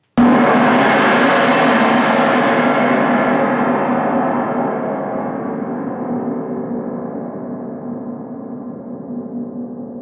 Fort, GONG VIBRATION

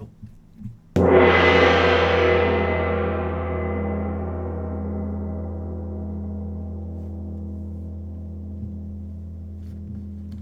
petit test, GONG VIBRATION

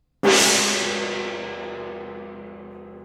moyen, gong vibration

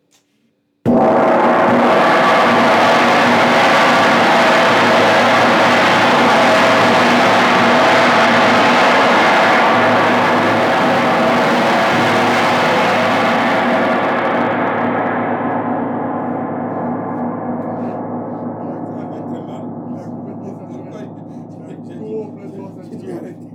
Grandgong, GONG VIBRATION; 3eme essaie repeter

